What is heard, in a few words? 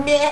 jump; quake2; sheep